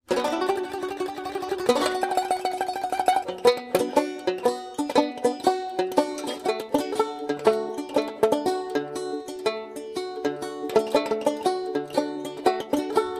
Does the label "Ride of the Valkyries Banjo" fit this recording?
Yes